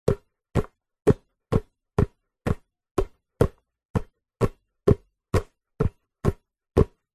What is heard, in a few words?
sport basketball juggle between hands slow; zapsplat